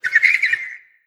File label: waiting call, headcrab reviver